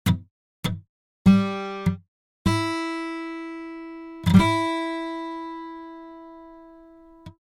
Gt C muted

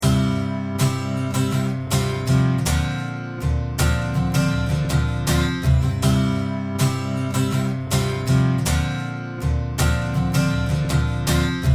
pan right

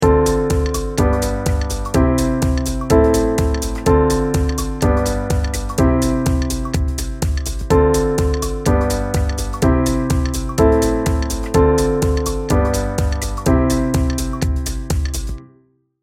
3step Dance mix

